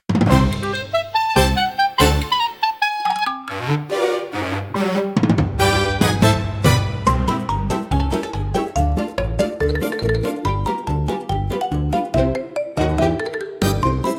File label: Bouncy Baton Parade, comical orchestra for slapstick gag scene